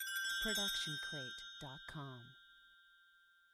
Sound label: Bells Ident; SoundsCrate